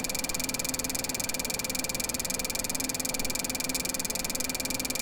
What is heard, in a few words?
clicks